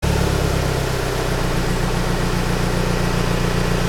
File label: Engine